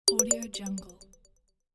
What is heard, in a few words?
incoming message